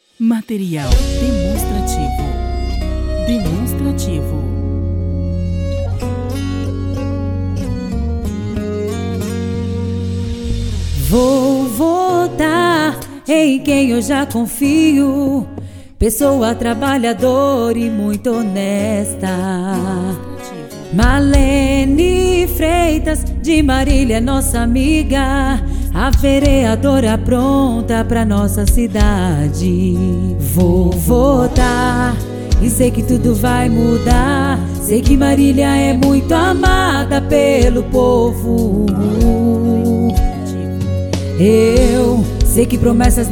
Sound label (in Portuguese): jingle politico voz feminina; Jingles Politicos